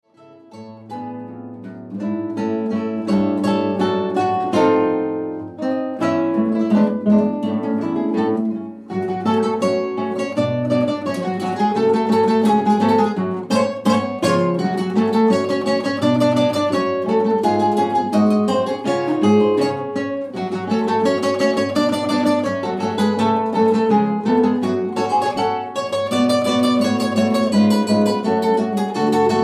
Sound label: Ragtime